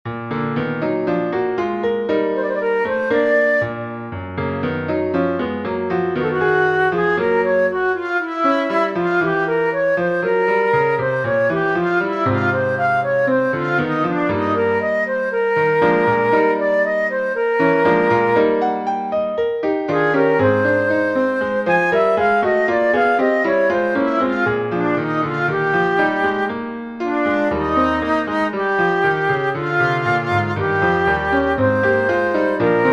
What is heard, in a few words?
flute piano; markull friedrich wilhelm; christus der ist mein leben